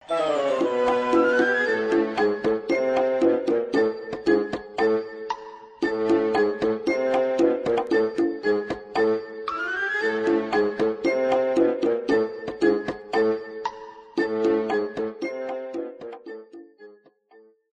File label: Failure; Monster Rancher 3; PS2